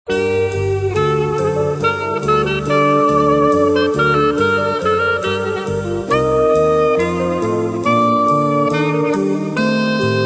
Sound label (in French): fugue etincelante